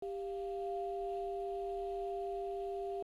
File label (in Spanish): Multiarmónicos